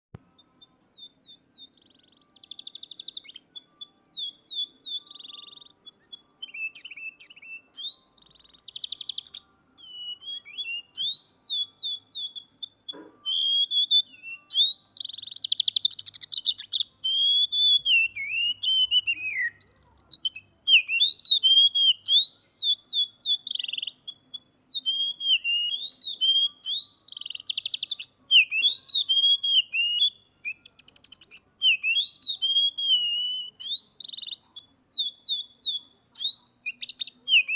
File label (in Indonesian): kacer tembakan kenari